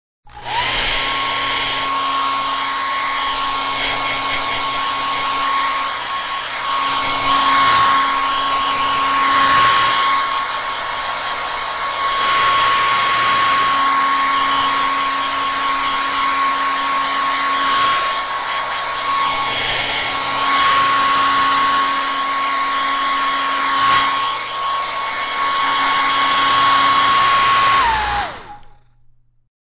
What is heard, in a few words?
Hair Dryer long